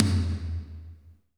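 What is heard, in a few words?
tom, drums; Best Service; Real Mega Drums VOL-1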